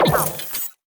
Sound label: Drive Hit